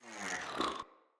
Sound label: Acorn Whizz; Cinematics; Bromius; Bromius Tree Rub; Bromius Quest